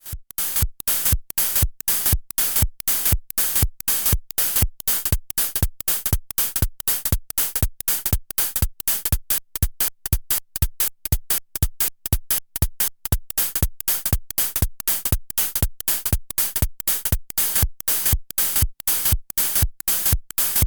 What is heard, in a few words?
Trigger Sequence phase locked LFOs LFO3 modulates decay; fourMulator